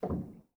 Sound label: rel, Grand; bitKlavier; Binaural